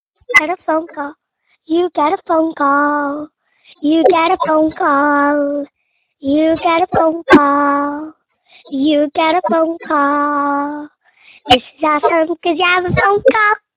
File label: phone call